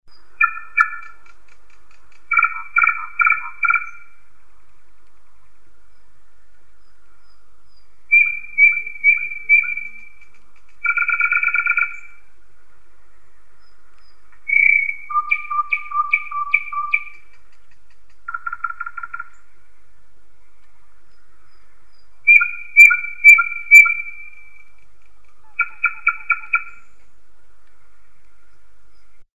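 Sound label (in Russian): solovejj, nightingale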